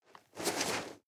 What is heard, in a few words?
nylon leather medium short